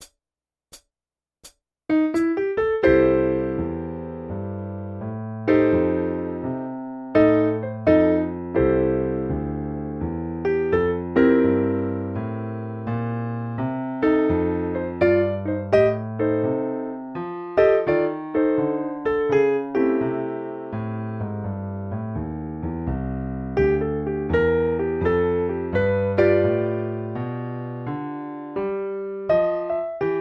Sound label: Walking Bass